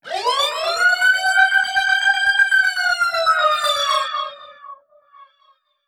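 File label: Modulation Proc, alarm siren samples, 120bpm; musicradar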